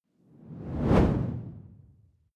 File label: whoosh simple hard low